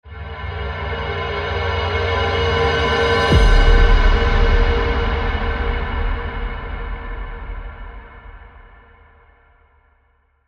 Subtle Reverse Hit

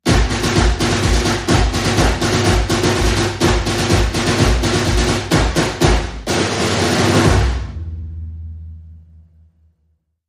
Vaudeville Drum Set

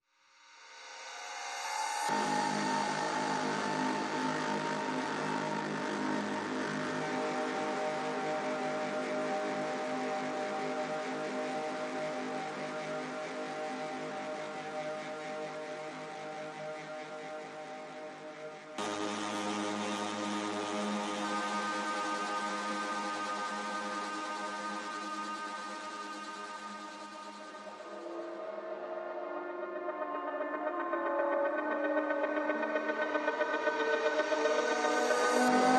Ambient

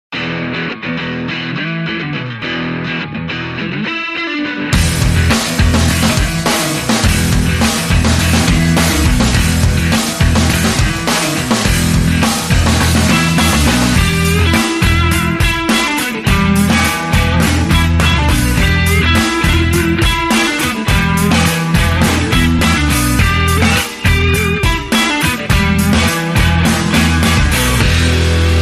Electric Strings Short

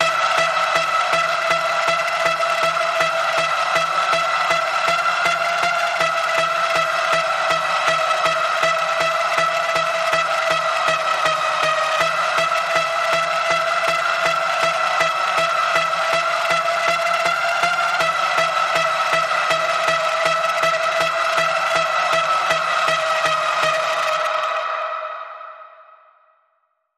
Paranormal Panning Pulse E, Key of E

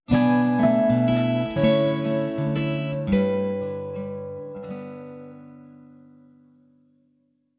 Orchestral

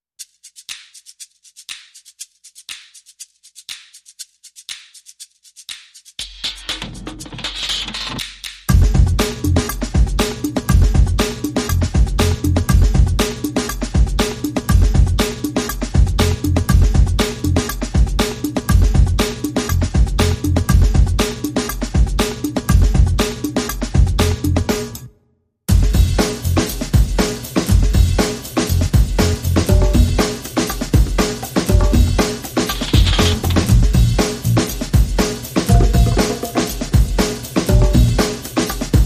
Drums and Bass Only

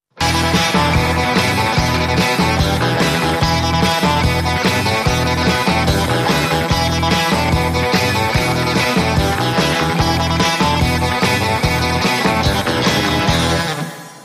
Zen, jingle

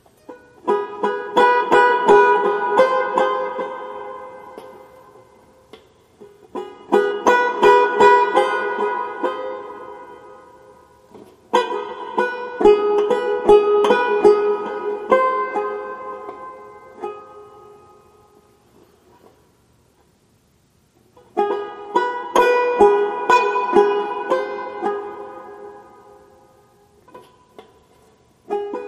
Suspense

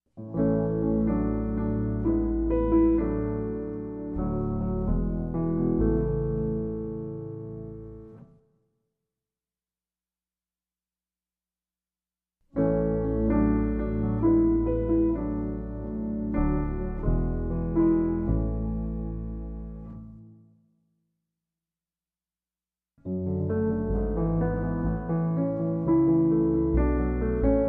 Underscore With Scenemaker Synth Pad

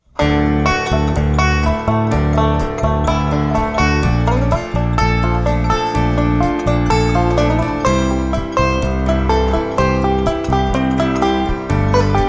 Banjo on the River

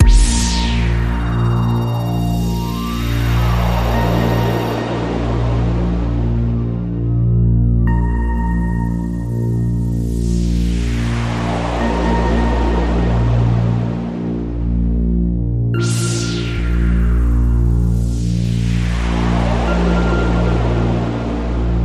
Radio Toolkit Pad

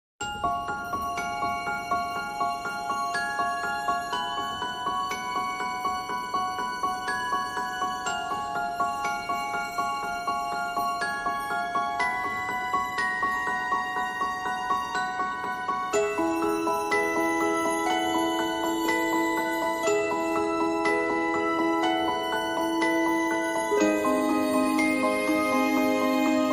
No Choir No Large Bells

